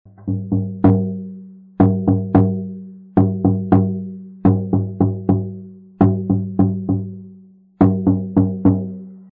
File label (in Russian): meditativnyj